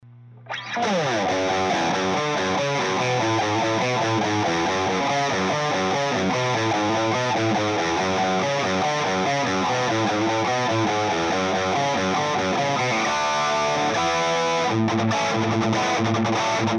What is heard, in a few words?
Rhodes; podhd